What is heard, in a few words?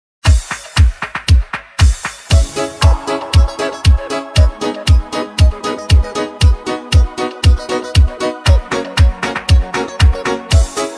super italo disco 80 s remix